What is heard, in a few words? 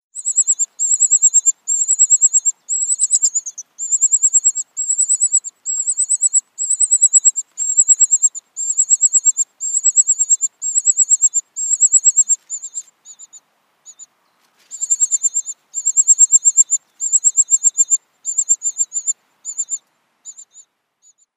Common Cuckoo downy Young